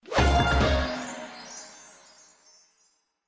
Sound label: SFX Symbol Scatter Bonus; slots; Panda Majesty2